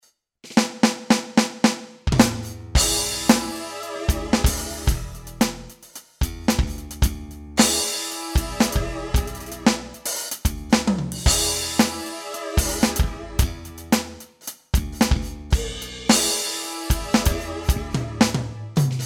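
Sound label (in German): Pro Kit Groove mit Melodiesample, drums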